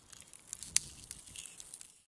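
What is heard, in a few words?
meth freezing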